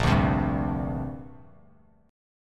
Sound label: A sharp 6th Add 9th, Bass Standard; strummed; JGuitar